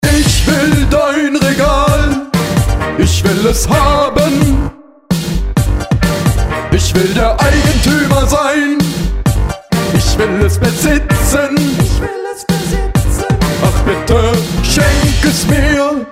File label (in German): Ringtone